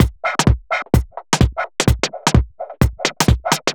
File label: Beat, uk garage